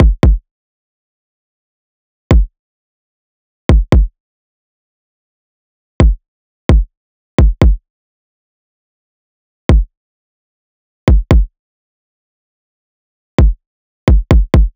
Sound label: kick 130, Kick Loops; drum sample pack; Dakataua; SEISMIC; Slate